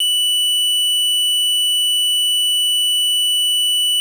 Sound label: square, 808, SubOsc; hiphop; StudioLinkedVST 808 Kontakt